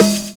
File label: SOME PERC; Univers Sons - Kitch 70s